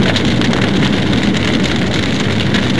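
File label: fire burn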